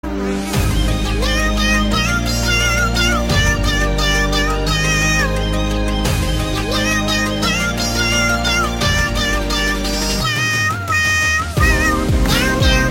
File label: Meow